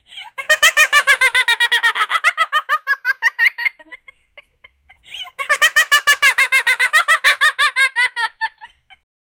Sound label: puppies laughing, puppy; rich